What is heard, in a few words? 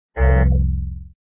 wrong word